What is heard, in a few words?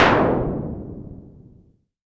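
snare, Moog Minimoog Drums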